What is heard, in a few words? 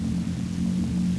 laserfist idle, weapons